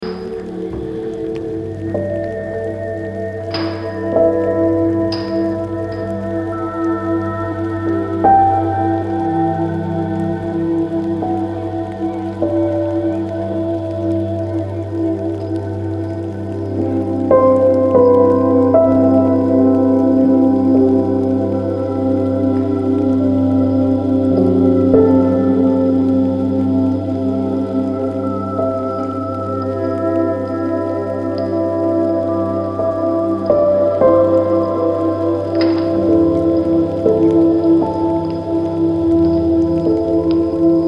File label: quiet noise